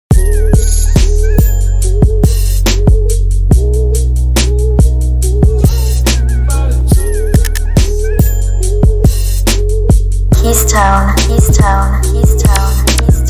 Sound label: instrumental